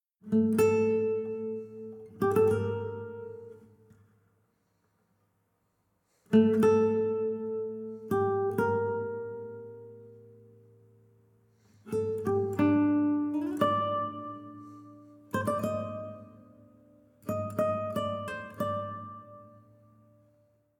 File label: Savannah Music